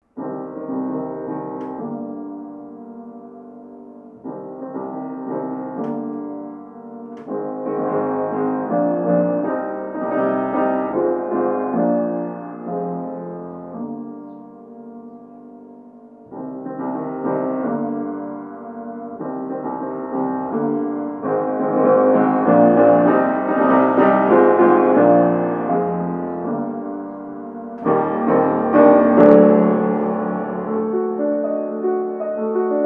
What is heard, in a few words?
piano; amplificada 3db